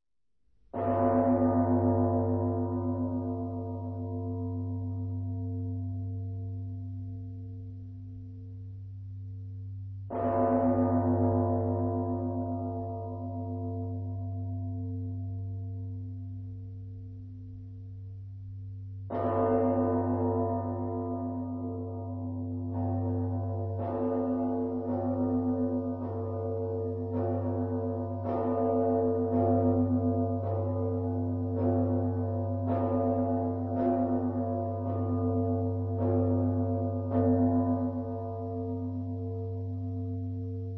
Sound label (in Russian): Zvon; duhovnayamuzyka; Russkaya